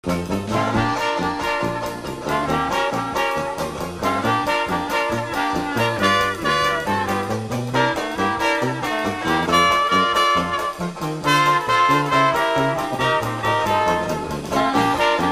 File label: swing